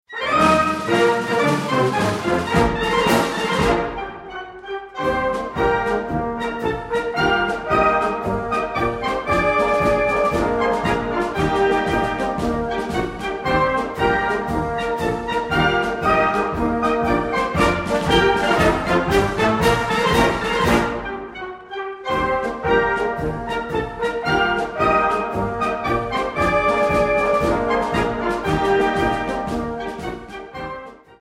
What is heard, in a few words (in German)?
Marsch; Alt-Starhemberg